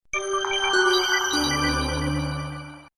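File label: power off; KU3800 Bubble Pop; KTF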